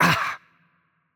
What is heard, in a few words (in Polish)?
Hurt Light; pl PL; bloom minigame